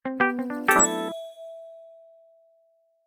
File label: closure, notification sounds